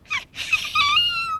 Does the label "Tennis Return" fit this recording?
No